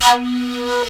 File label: FLUTE LIN; Best Service; Ethnic Journey Sound Cube